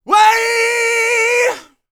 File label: R ROCK, MALE HUMAN; R&B & Dance; ILIO; Vocal Planet